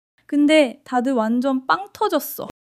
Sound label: laughter